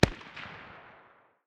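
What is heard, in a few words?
light machine gun far distance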